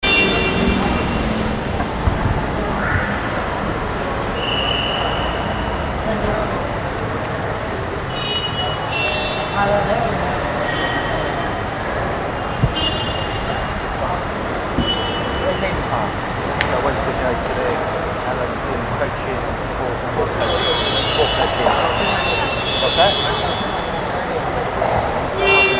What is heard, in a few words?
streets airport, South India street recordings